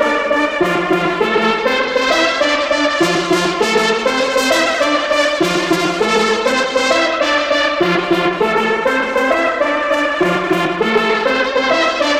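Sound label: 100BPM D minor Horns; BRM Vol2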